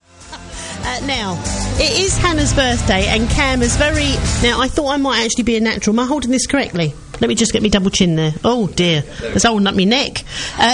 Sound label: violin playing